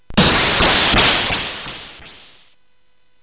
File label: Tecnetium, CSG8580R5; hvsc